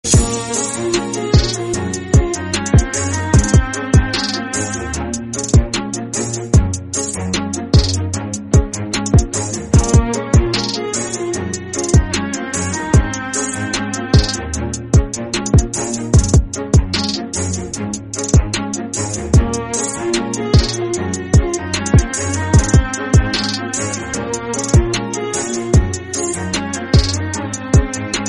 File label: theme music; blox fruits drip fruits